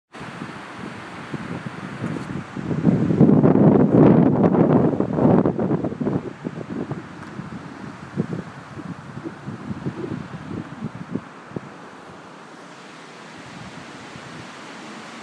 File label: wind at wells